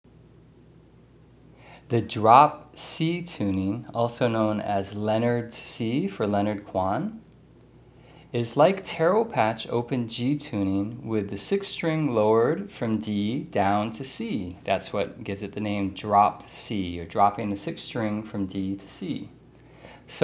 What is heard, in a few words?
Drop c, guitar